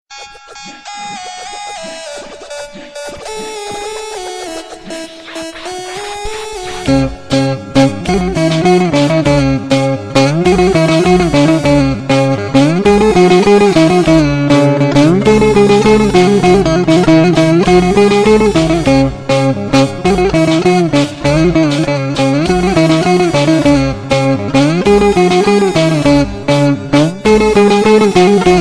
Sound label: turkish baglama